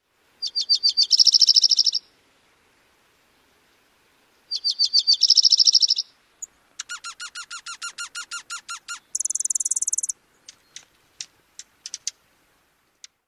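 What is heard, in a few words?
2-parted songs followed by various calls, Dark-eyed Junco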